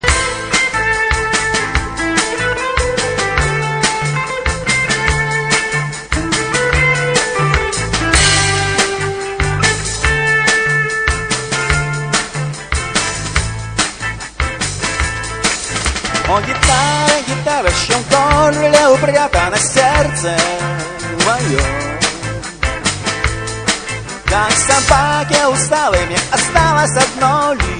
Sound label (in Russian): gitara